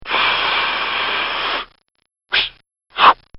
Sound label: Cartoon Sound Effects Slurps; Cartoon Sound Design